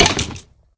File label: hurt, skeleton; minecraft; mob